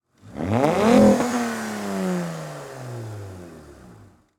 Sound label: Car Revving Engine And Exhaust Mix